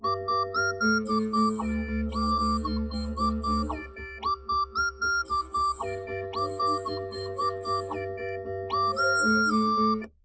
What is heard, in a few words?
nodrums; SiSEC10; underdetermined